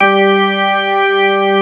Drawbar Organ G2; Proteus 2000; Digital Sound Factory Proteus 2000

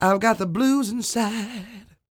CROON, Vocal Blues & Soul MELLOW

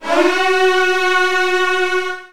F#2, Virtuoso Strings; ILIO; Kirk Hunter Virtuoso Strings VOL-2

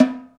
St Snare Wires Off, Roland BK-5; Snares

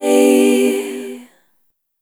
Chord G Major; Sonic Foundry (Sony Creative Software); Pocket Diva World Class Vocal Samples

